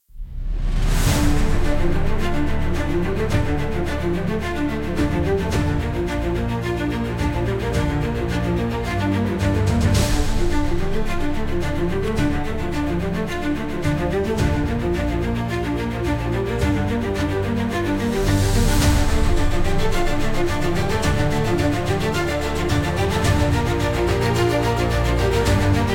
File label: symphonic, instrumental